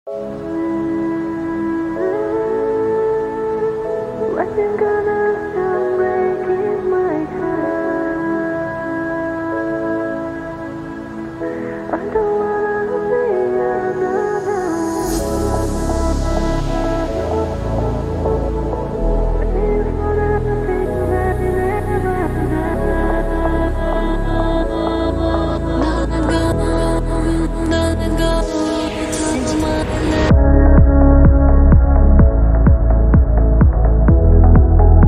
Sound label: phonk remix